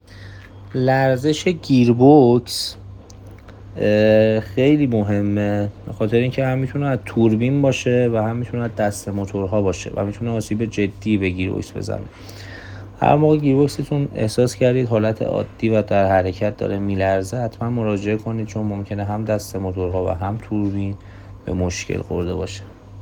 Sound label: Gearbox vibration